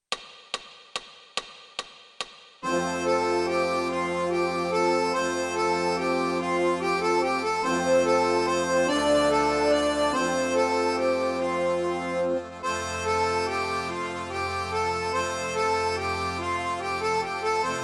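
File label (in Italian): Il Mattino, Fisarmonica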